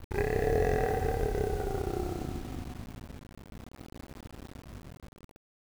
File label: zombie; halflife